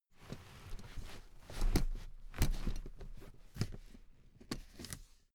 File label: suitcase handling rush